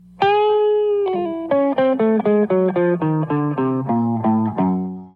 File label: Riff